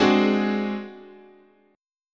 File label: Ab 7th Flat 5th, guitar chord